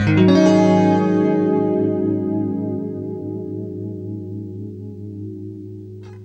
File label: FLESH CHORDS, Guitar